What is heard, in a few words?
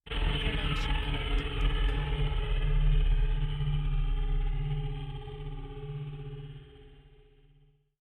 Machine Charge Down; SoundsCrate